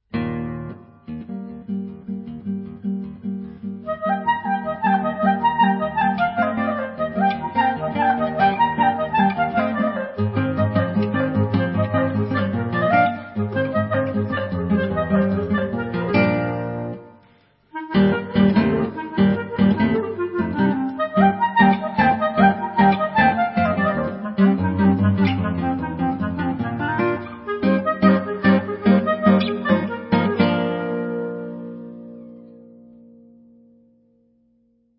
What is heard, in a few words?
ROMANIAN FOLK DANCES SUITE; per clarinetto e chitarra